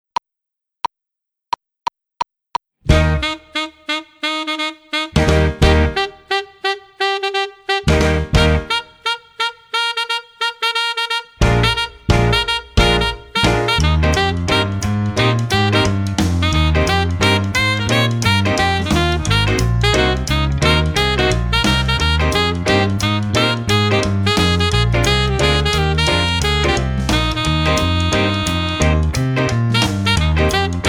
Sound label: - A Sax, 薩克斯風伴奏